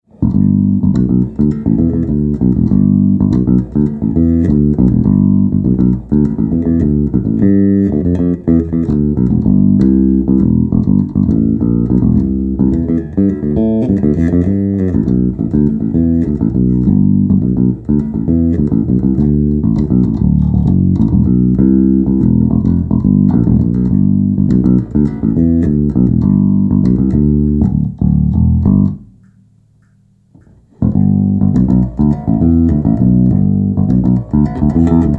FINGER, bass; olympus

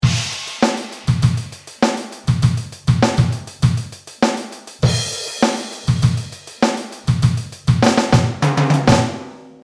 DRUMS

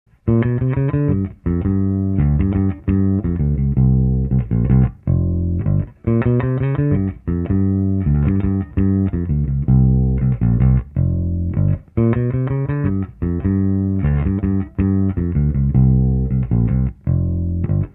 STM900 sealed, 2x15 cab; custom diy 2x15 cab build

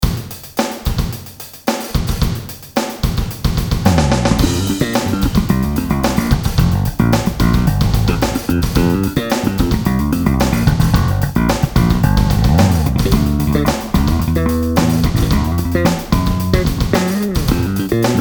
SLAP, bass; king koa; nordys

